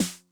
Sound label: Snare Mid Tuning High Tone Mid Snap, 909; classic machines